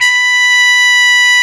B5 C, BRASS

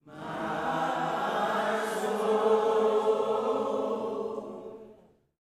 MY SOUL, Vocal Planet Gospel; Spectrasonics; GOSP PHR

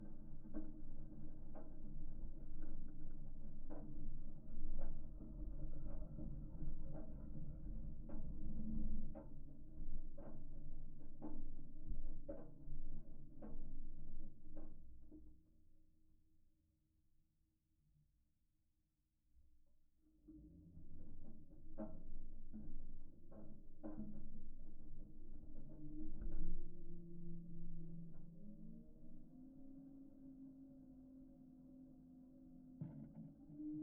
Geofon, Rumbling, Dark, Drone; underground sounds

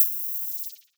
HH, Dance Mega Drums; Best Service; HH HOU

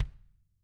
Tama kick; ILIO; Double Platinum Drums 2

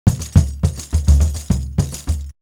Percussion; AMG - Coldcut's Kleptomania VOL-1

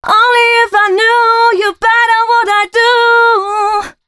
female c# 138, hooklines 136-140 BPM; Mutekki Media; Dance Ultra Voice VOL-1

